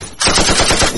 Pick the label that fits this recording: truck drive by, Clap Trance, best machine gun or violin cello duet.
best machine gun